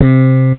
SlapBass; Beyond HyperCard

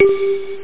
bell

